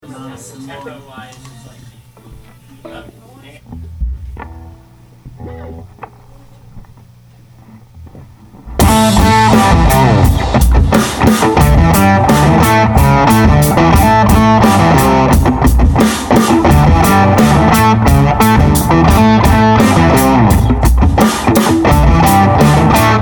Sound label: Guitar